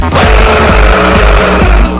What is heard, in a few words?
Brrrr Sms